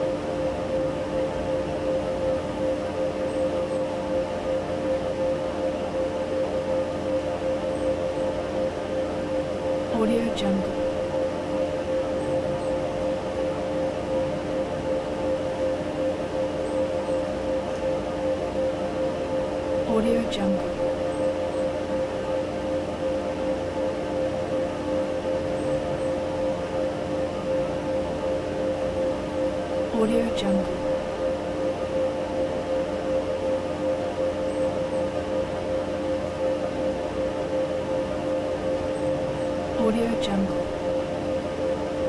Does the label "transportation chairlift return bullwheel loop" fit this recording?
Yes